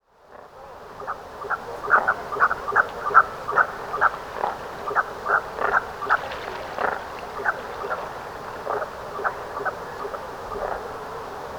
Typical mergansers, مرگوس

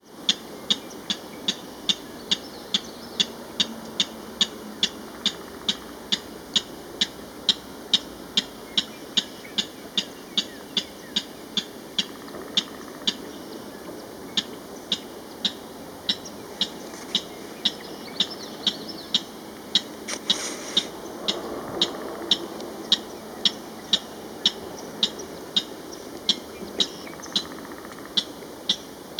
King rail; پرنده یلوه شاهی

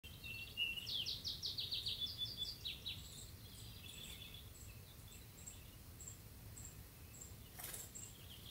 Valley

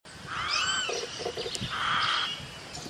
Snowy egret

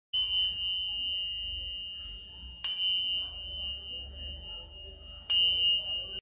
tingsha

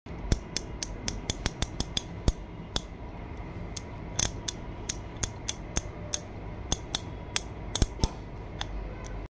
Ratchet wrench; آچار جغجغه

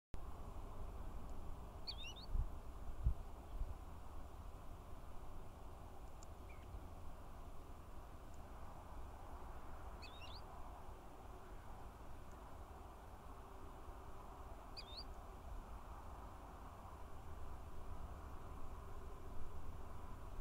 Pied wheatear; چكچک ابلق

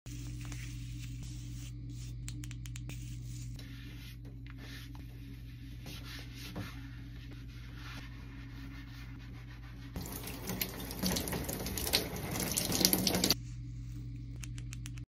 Brush on skin; کشیدن براش روی پوست

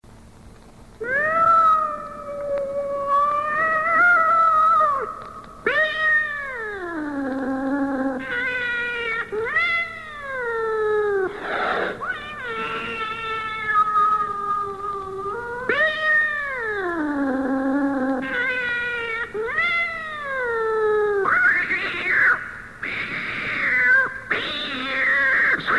cat sound

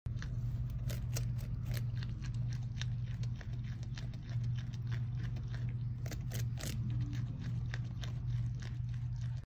crested porcupine; تشی کاکلی

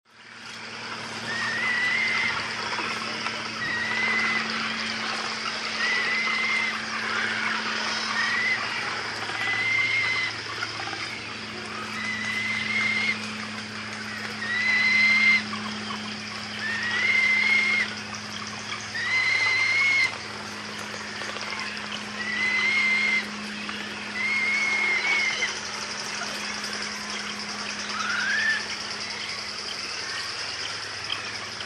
frigatebird

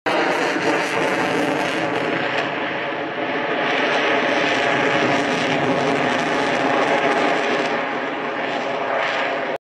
F 22 Raptor; رپتور

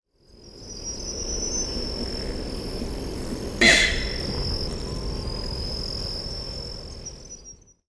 Tasmanian nativehen

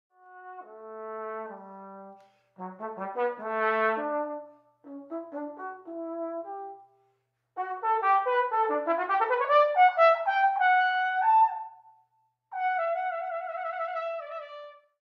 trombone

